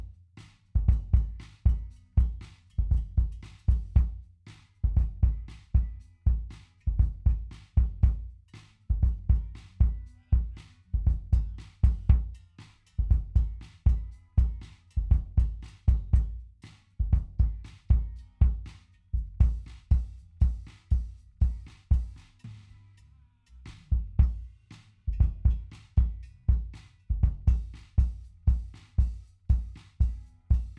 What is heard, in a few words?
Kick